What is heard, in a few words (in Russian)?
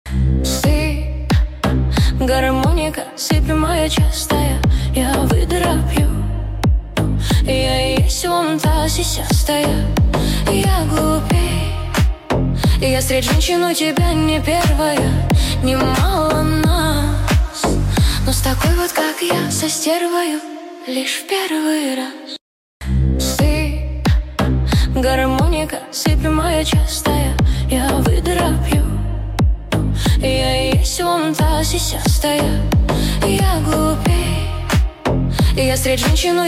garmonika remix